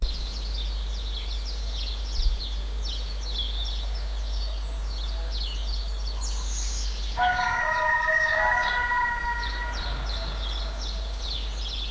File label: a peaceful village early, early morning